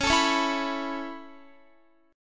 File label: C sharp Minor 7th Sharp 5th C sharp Guitar; C#m7#5 strummed